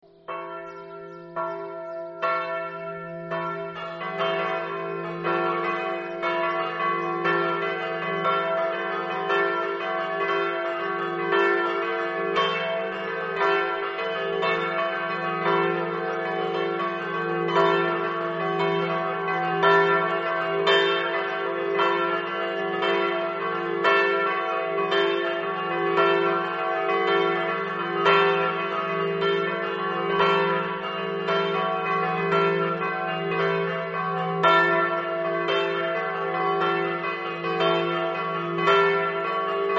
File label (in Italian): campane; ipplis